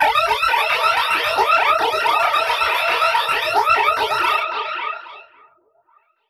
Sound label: Modu Proc, alarm siren, 110bpm; musicradar